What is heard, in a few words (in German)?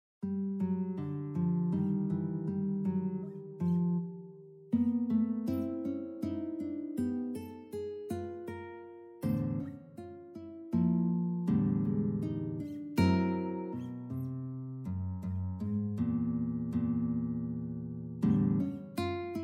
leichte Gitarre Stuecke